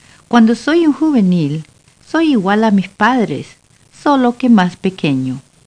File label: Sound trump